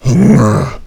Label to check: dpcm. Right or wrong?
Wrong